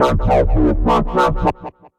Cyclon, Bass; Ableton; Skitter and Step

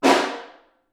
Snare Far

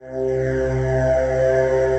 Aahs Voice; Disembodied Voices

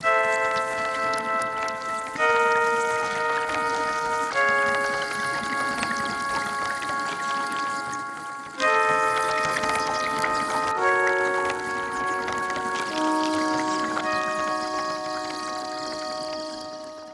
Underwater Chords C# Major 112 bpm; MIDI Clips; Tonal; Ableton; Factory Packs; Lost and Found

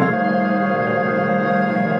Weird Harmony; Inspired by Nature by Dillon Bastan; Vector Grain Presets

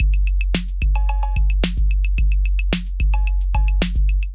808 Kit groove 110 bpm, Drum; MIDI Clips; Aristocrat; Ableton; Drum Essentials

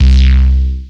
C1, Foundation Analog Synths; Hottbottom; Ableton Foundation FreePacks

